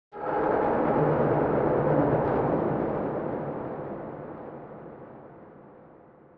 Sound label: hollar